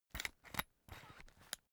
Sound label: umbrella telescopic retract slow